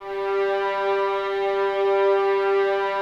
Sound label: Violins G 3, String Ensembles; Miroslav Vitous